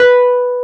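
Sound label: Wurlitzer B2, Keyboards Old School; USB Soundscan vol.09 - Keyboards Old School; AKAI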